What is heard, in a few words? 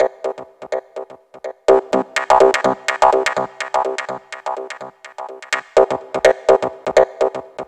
SYN 125, House synth loops